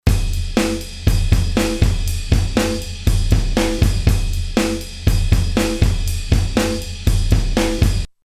drums vint comp fast

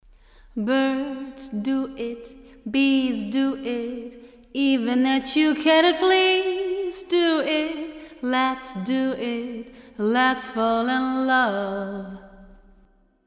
Vocal Hall

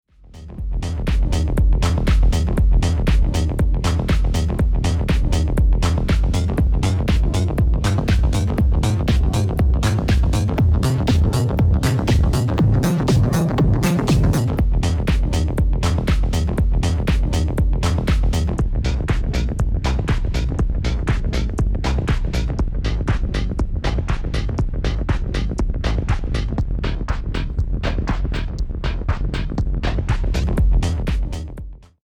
PLX 500 Pitch

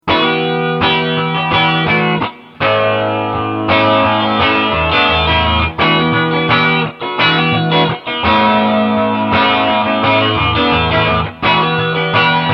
strummed chords

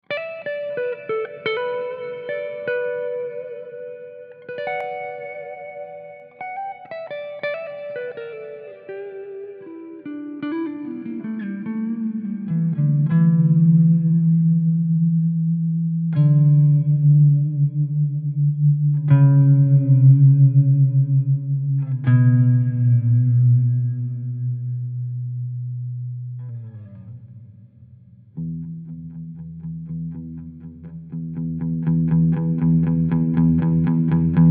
Guitar The Catacombs

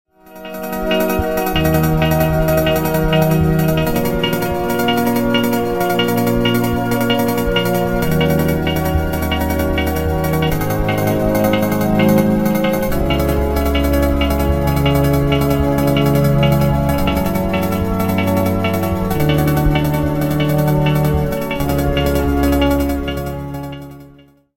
delta filtermod

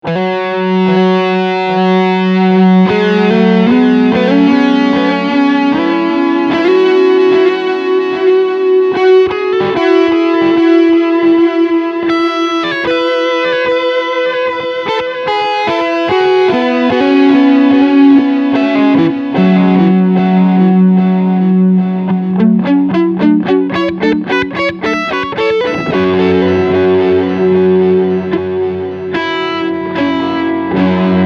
Long Delay Overdrive

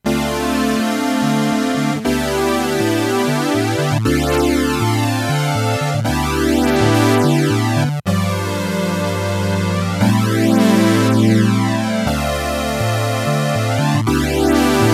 Sawtooth Zero Point Flanger; Catalinbread

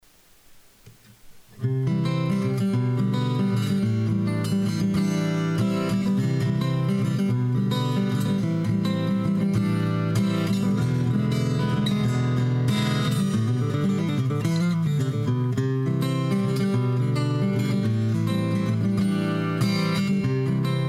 AGit PreAmp EQ Comp

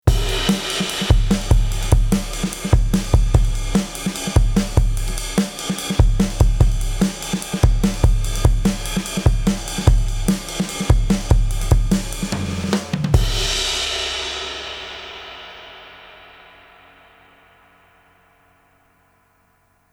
Drumbus Fx comp drywet mix; Cameleon Labs 7721

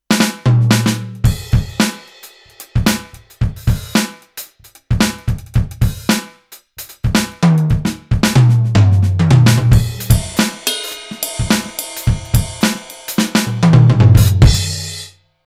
Roland TD-4KP

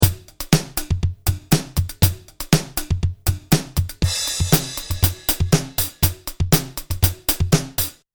Drums VariTube

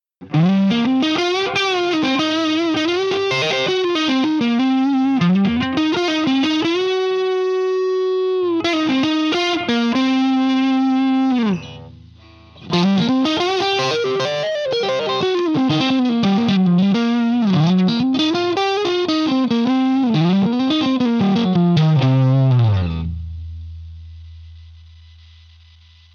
Bluesy Neck HB SC

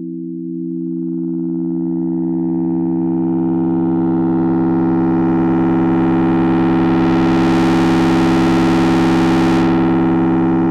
Waldorf 2 Pole Sine Pad Drive

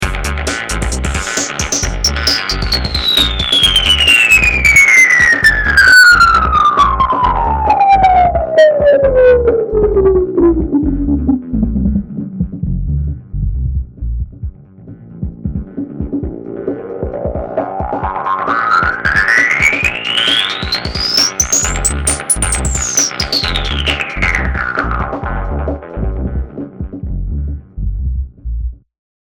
Guit Song; Frohmage

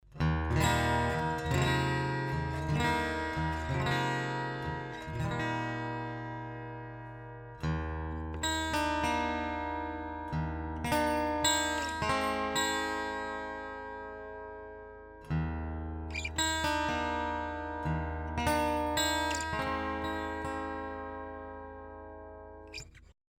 114ce DI Open Chords; LTD

